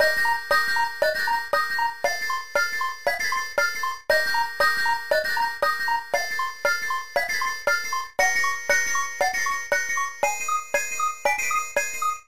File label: bonushouse, music; superbroswar